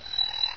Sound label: he idle, houndeye; source engine; hl1; video games